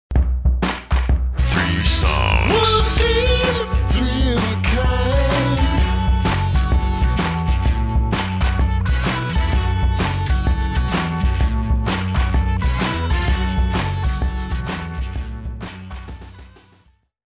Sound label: intro, Roll & Rock